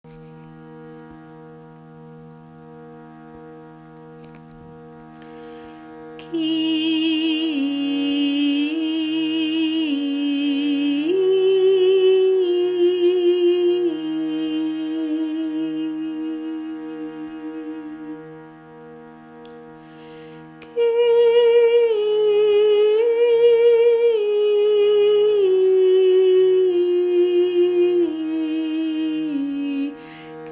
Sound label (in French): Shrutibox et chant; chant de force Ki